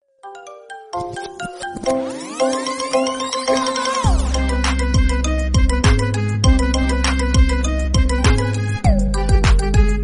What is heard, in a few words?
MARIMBA REMIX RINGTONE